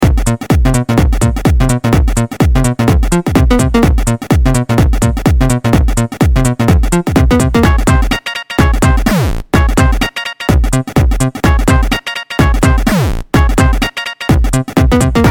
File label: ringtone